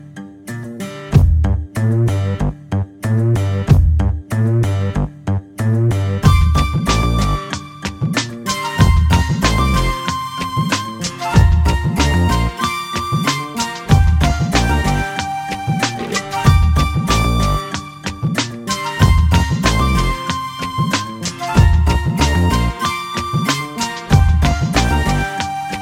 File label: indian techno